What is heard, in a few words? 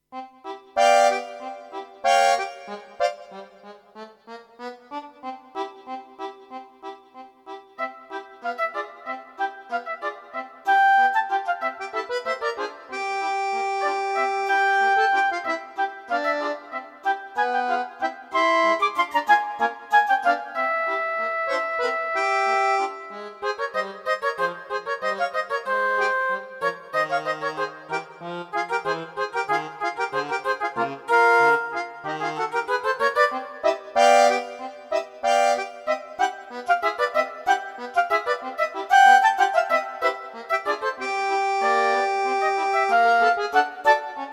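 Czech accordion flute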